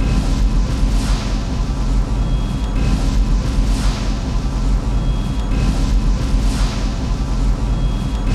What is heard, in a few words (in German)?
MASCHI; Best Service; Advanced Mega Effects